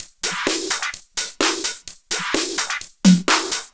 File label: Electro Loop 128 BPM; Vengeance Samples